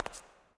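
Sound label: reverb walk, footsteps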